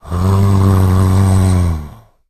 idle, zombie; justzombies

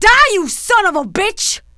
female die; kingpin

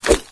fist miss; dn3d r

